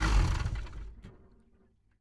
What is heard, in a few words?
vehicle military turnoff